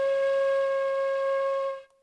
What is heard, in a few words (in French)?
tube